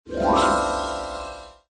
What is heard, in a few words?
super win